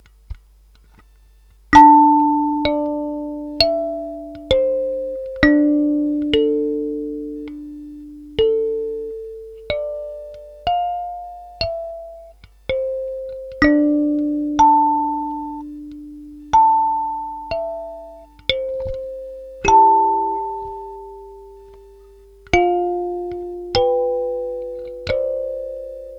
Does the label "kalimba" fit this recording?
Yes